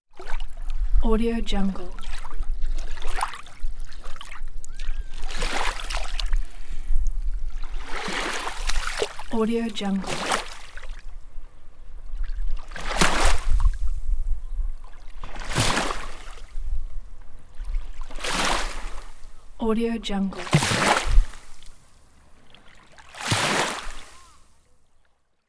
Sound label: sea waves lapping